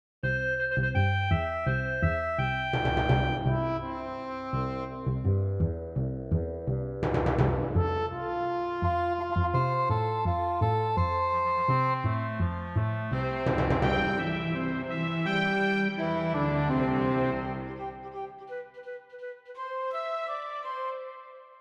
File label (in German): klingelton dreiviertel